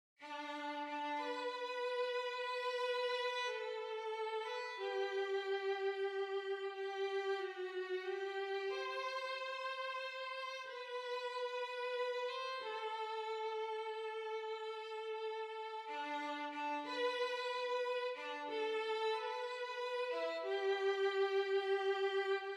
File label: Violin Solo; The Garden Bridge